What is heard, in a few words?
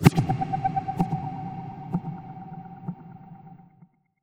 FX Impact; Mutekki Media; Vengeance Electroshock Vol.2